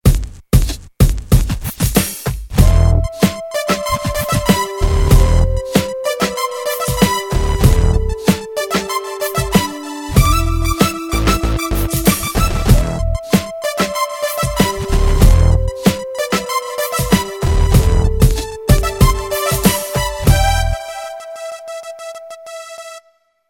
Cherry HIPHOP, ringtones

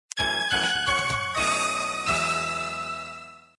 Power Off, phonetones system; KV500 Cookie

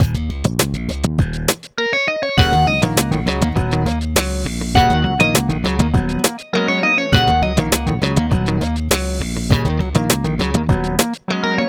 Throb; Gionee; ELife-E7

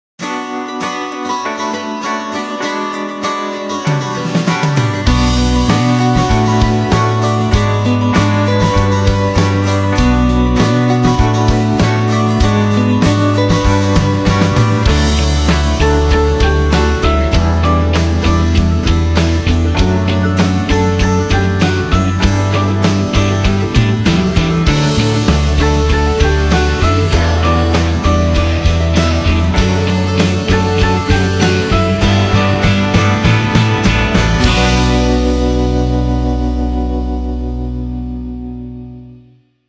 Bright-eyed, phonetones Nokia; 6700 slide

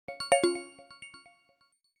Notice, notifications; HTC One-X

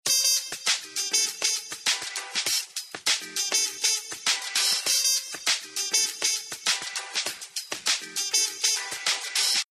Retro Beat

